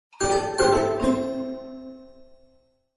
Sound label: cleaning